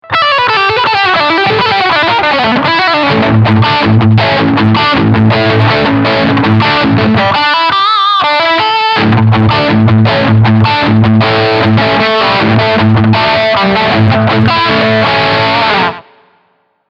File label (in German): Crunch Riff Steg Pickup